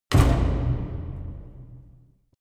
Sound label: orchestra grancassa wood